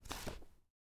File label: Book Close